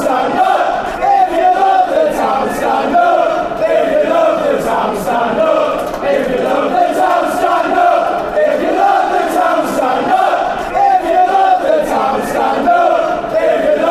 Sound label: chants; stand up